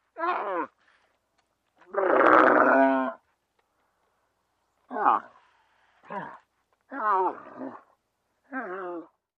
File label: Camel Grunts; camels